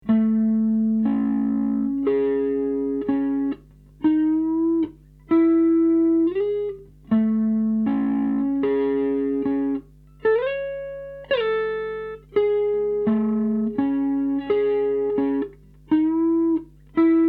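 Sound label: slow, rock guitar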